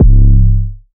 hypertrap bass, 808

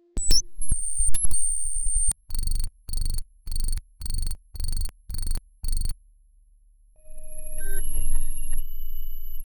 gritty sci fi robot hud, powering on

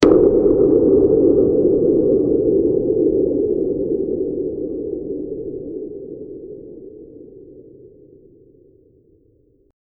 black hole sound; universe

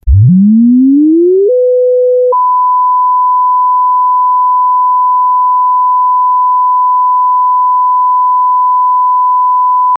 start signal, sport

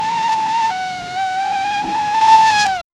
seamless looping tire squ; drift

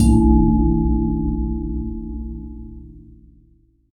water drop gong hit a low

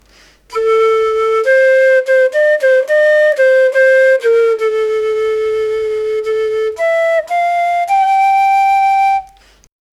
joyful flute and mridanga

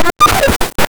glitch

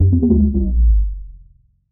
bounce

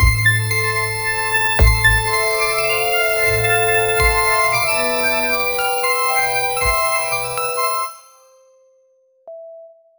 outro jingle

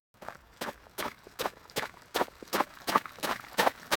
running on the grass

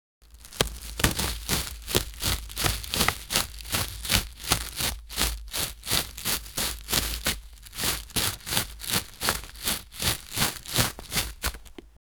tanks

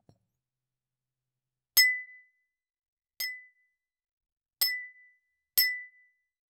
glass slicing

